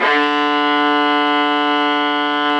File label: STR VIOLA, Str Quartet, Strings